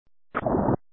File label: Splash